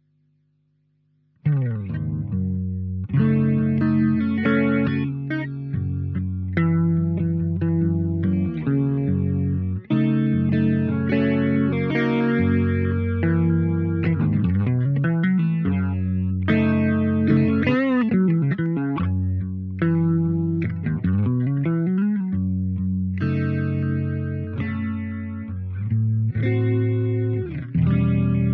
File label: guitar